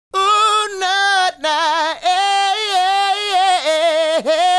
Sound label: Male Solo, Hip-Hop and RnB Vocals